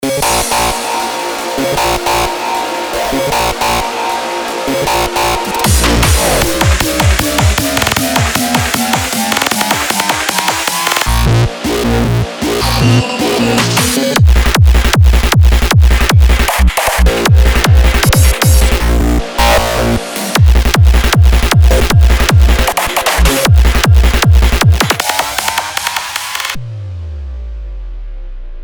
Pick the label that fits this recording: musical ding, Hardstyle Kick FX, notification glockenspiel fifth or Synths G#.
Hardstyle Kick FX